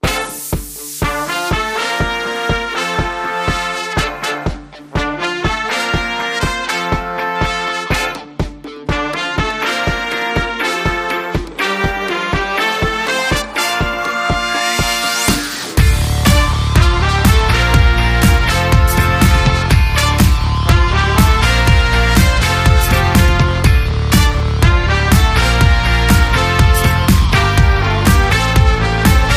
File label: No Dist Guitar Synth and Synth Bass